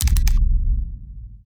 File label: main menu press settings; ui